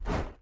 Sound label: whoosh; Bunnicula - Juicy Bites